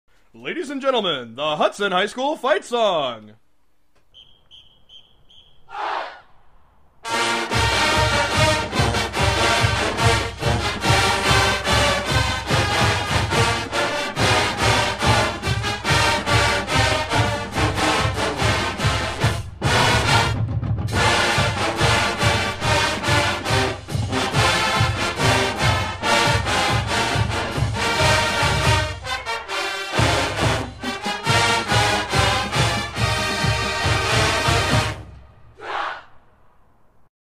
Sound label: Fight Song; Hudson; We are Hudson, We are Proud, We are One!